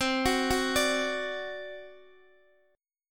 C Suspended 2nd Flat 5th C down Guitar Open D

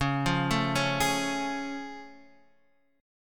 A Augmented Major 7th C sharp

